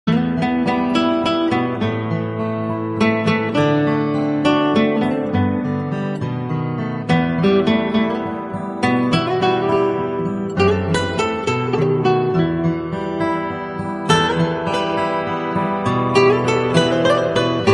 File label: GUITAR RINGTONE, ACOUSTIC GUITAR